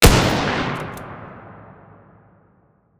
tools rifle

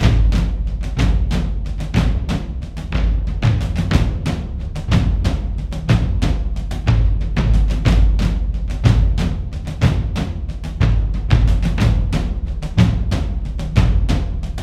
Drums; For Honor

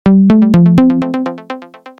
Rainbow Shard Collect Louder

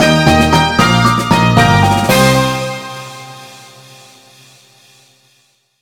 Deathjingle; Kim Possible Kimmunicator

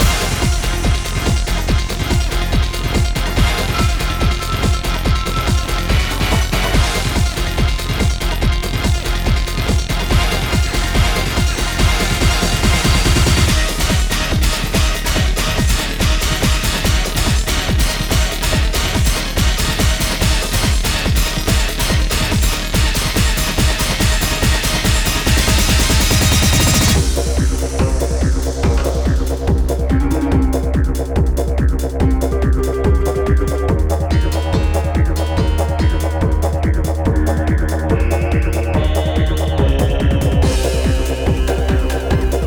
bgm battle; Samurai Jack Battle Through Time

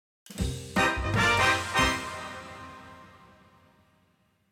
Win Perfect; Soap Dodgem

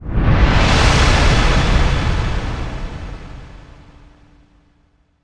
level end; ChromaGun

